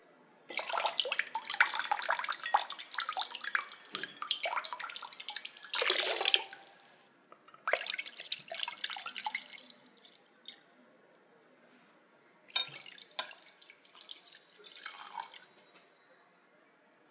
Cristaline Water Wine Flurr Glur Glob Blob From Bottle To Glass Occasional Cling